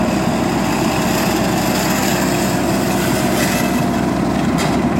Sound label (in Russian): gusenitsy po asfaltu lyazg